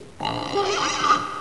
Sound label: zombie bomb idle; left4dead2